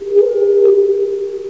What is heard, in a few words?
cylon eye; ringtones